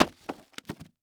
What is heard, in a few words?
Household Book Fall To Ground